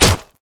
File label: Gun Skin, Damage